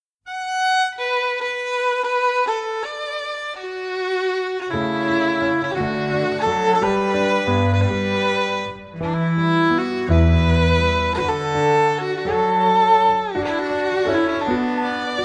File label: Set Of Waltzes